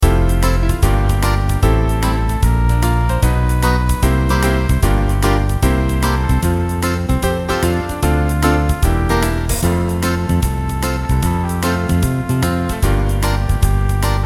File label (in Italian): Riverside, tromba trombone duetto